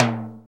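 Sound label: percussion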